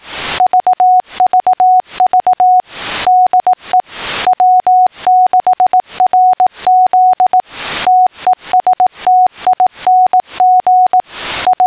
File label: clicks 6 kHz